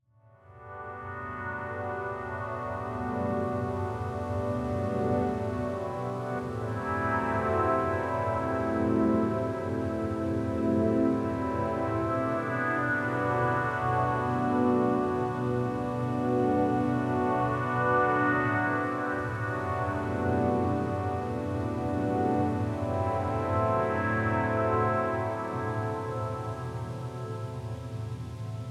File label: Cozy Cottage Fire Pads